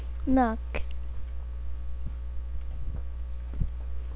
knock

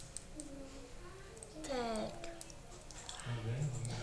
pad

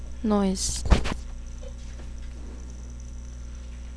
noise

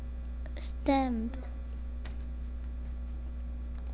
stab

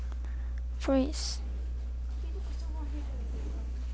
breath

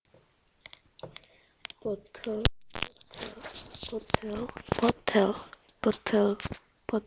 bottle

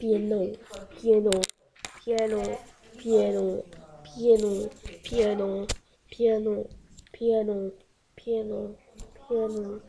piano

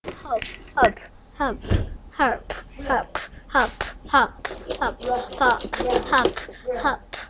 harp

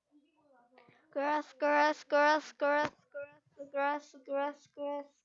glass

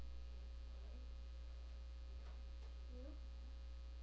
knife

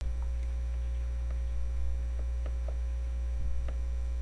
rain

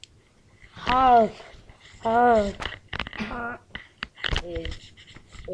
harp

